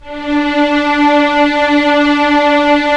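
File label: STR String Section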